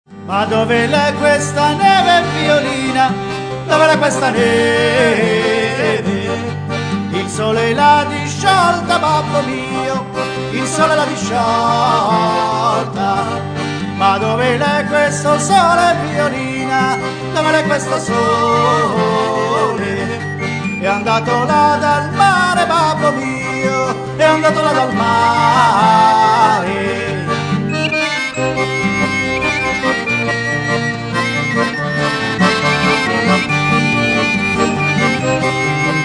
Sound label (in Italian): violina